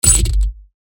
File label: G, percussion; revealed percussion vol 1